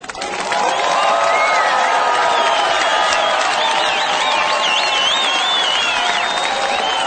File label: cheer whistle